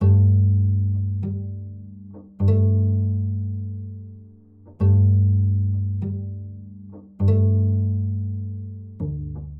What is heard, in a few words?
100 G Orig Stem Cello; Opus8